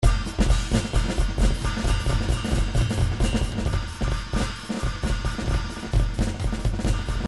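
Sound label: drums jazz solo; Paul Kodish Dangerous Drums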